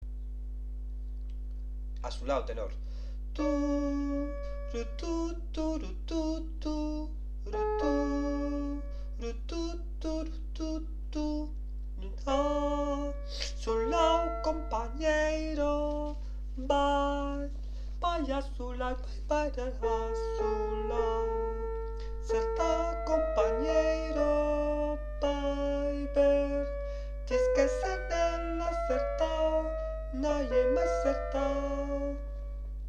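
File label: tenor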